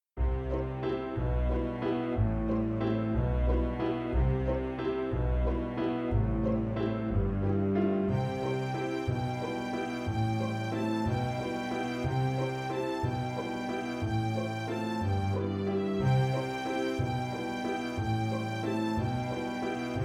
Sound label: String orchestra or Quintet piano accompaniment